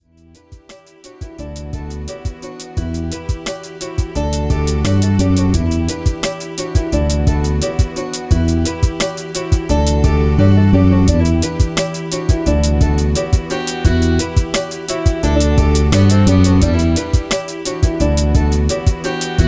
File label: drumola